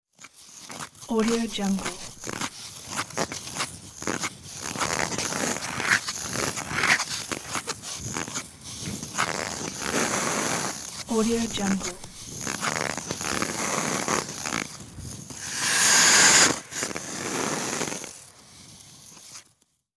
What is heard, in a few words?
ice hockey skate stop